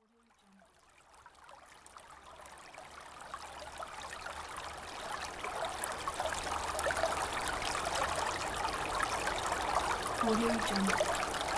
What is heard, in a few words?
river and birds